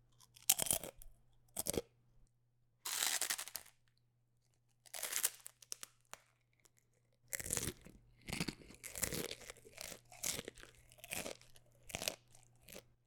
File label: Crunchy Bites